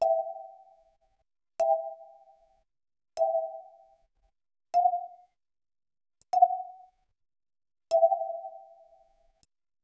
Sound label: soft digital button click